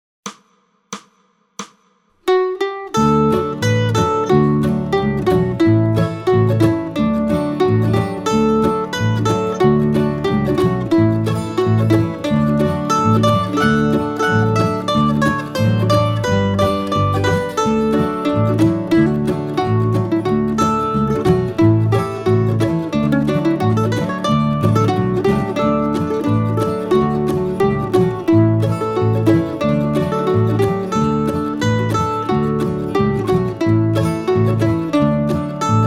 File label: Wildwood Flower D Mandolin 180